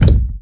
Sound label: Power Up; Defiance